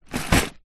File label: leisure box movement table; lego